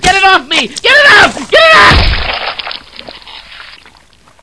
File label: snark body; svencoop; echoes; scientist